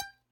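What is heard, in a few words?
Hohner Special20 vibrato release G4, harmonica; Aerophones; Releases; Free Aerophones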